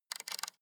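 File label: sports car interior volume button spin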